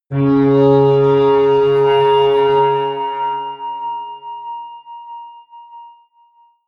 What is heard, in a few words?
atmospheric feedback synthesizer low, drones